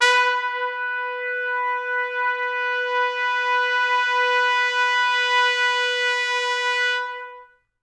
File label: B3 -R, Pop Brass; Best Service; ProSamples vol.19; AKAI